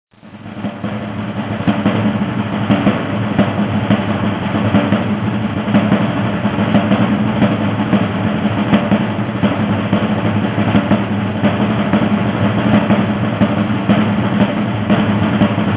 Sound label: Drum Beat Long Roll